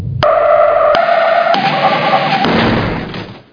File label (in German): reifen aus kies; gp500